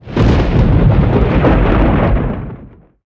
thunder clap

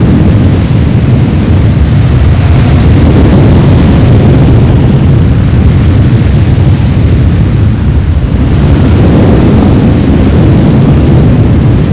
wind below; breakfree3

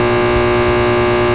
light hum; svencoop; toonrun

